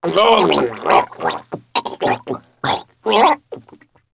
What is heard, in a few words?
gargle; Sin demo; player; blade